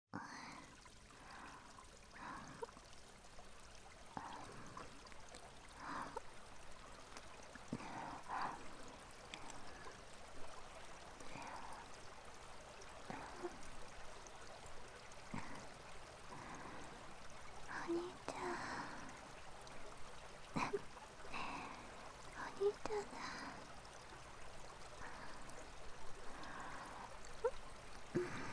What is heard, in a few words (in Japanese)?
姉妹のW耳舐め; 耳舐め&耳ふーサンドイッチ 田舎の癒し ～山形県遊佐町音源～; 環境音現地収録; 安眠特化